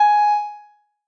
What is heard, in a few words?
noteblock g sharp 2; mesecons